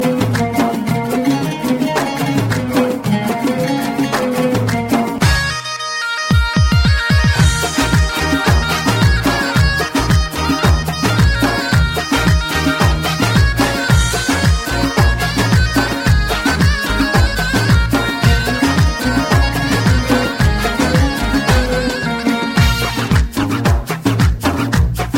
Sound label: Dance Song